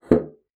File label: BASSOON; Xsample VOL-6